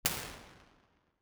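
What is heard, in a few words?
impulse response, St Patricks church Patrington model; b-format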